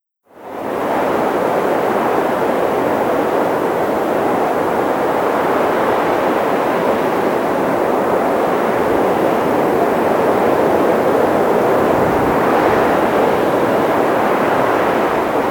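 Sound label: Cities Las Vegas Wind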